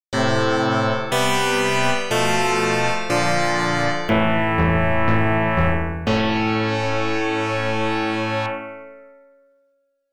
BGM Clear; Oretachi Game Center Zoku; Contra

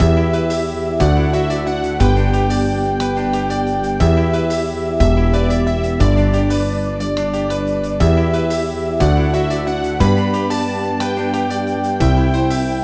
BGM Cheer; moshimo saibanin ni erabaretara; nds; Hyogi

